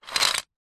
leisure game plastic panel squares on board tip movement; rubiks race